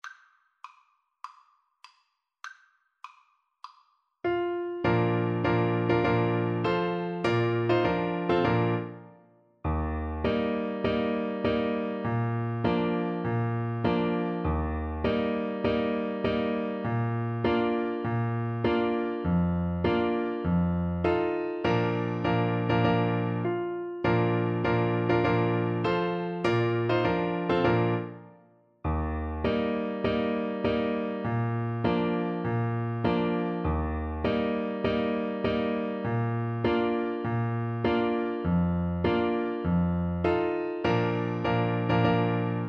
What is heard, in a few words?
hohenfriedberger march, Trumpet